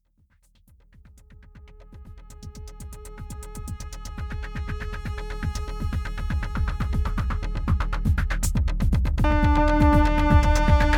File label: Arturia MatrixBrute